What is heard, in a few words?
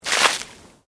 Water Splash Concrete Medium; Elemental weather effects; Water Sound Effects; Nature